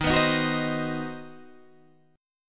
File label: C Minor Major 7th Flat 5th, D sharp, guitar chord